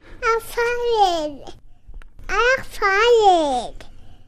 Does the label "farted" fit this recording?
Yes